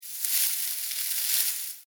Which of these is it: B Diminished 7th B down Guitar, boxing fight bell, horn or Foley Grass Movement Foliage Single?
Foley Grass Movement Foliage Single